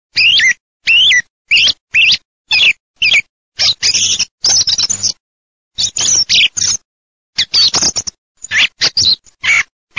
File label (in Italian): TORDO